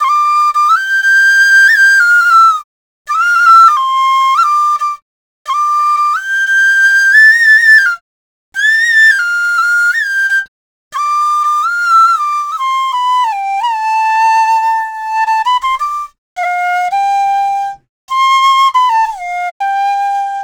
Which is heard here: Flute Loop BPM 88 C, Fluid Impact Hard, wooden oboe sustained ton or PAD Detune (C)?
Flute Loop BPM 88 C